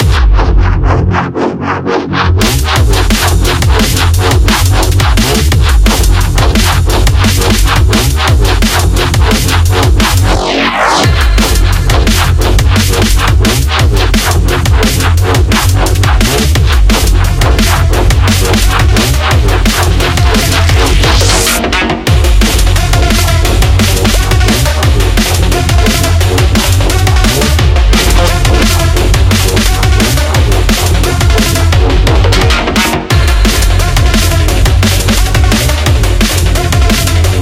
Rave; Barotrauma; DynamicEuropa

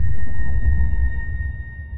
sonar Tail Suit Close; Barotrauma; Real Sonar

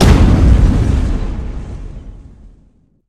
fire; Barotrauma; Barotraumatic; Deadnought

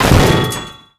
railgun; Weapons; EK modern; Barotrauma

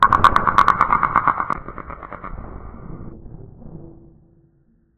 damage; Barotrauma; Barotraumatic; Legacyendworm

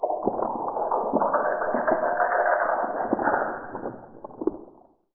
death; Barotrauma; Barotraumatic; Coelanth

